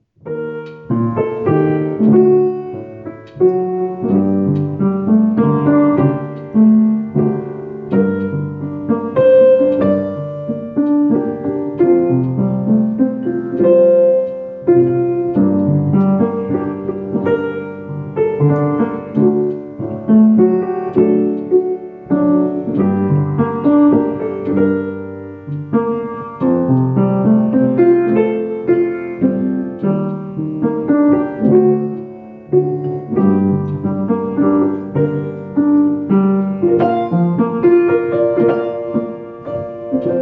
accompaniment

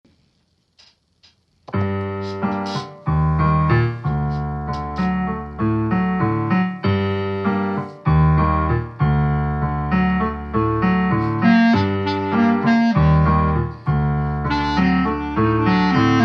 Clarinet with Solo

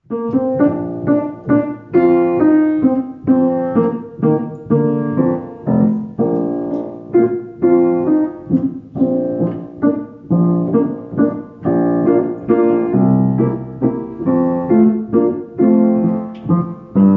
accompaniment

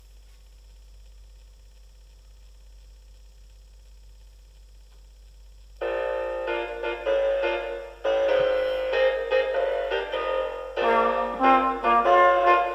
Strollin Trumpet; Grade 1

